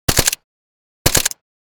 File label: AK 47 assault rifle suppressed fire; gfxsounds